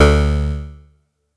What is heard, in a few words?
atari 520st